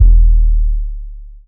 BD, Drums Dance & Groove

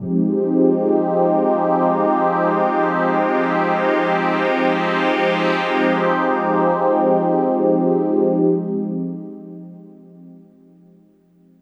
chords

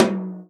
tom, Real Drum Kit, jungle kit

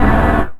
SFX, Drum & Bass, 150 BPM; Ueberschall; Drum & Bass Resonance

